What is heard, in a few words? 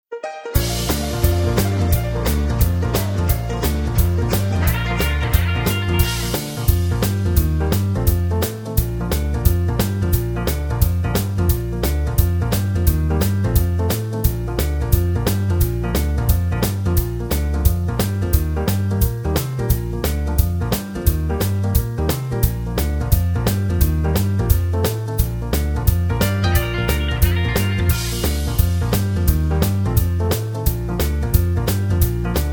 (Bb) No Backing Vocals